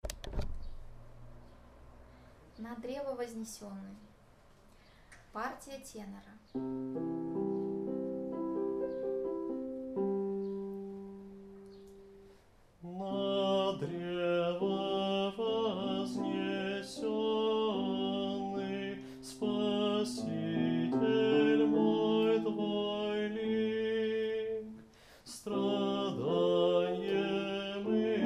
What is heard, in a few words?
tenor